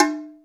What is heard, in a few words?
Sour Milk, Drum Machines Alesis D4